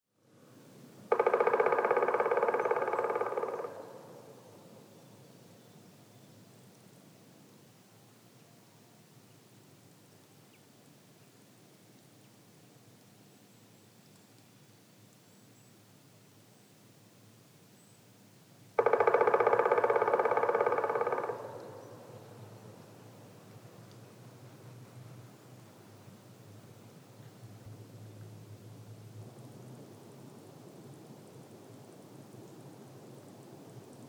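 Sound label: zhelna golos, black woodpecker voice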